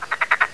frog; Internet 1996 World Exposition; Japan; NTT; night

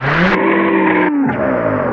cruiser gun back

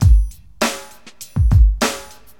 DM LOOP, HipHop, Drums

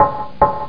knock; HotLeather2

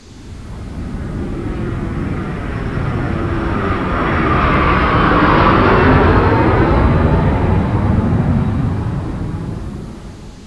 jet

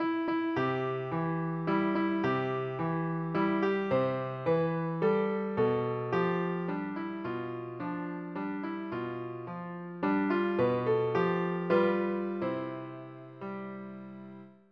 PIANISM

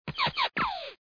explo

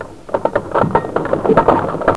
Pull-cord; Curse of Dragor

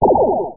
Energy Blast; Realmz

